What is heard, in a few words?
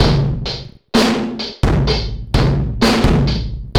CB LOOP; Zero-G; Chemical Beats